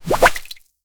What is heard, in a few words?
short viscous slide; mid frequency